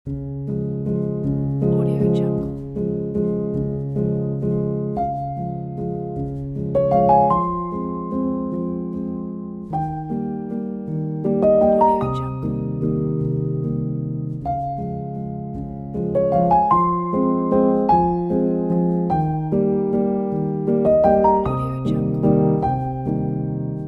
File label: soft piano